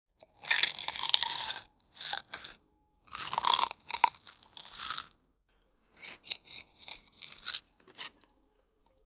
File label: tearing crisp lettuce; food preparation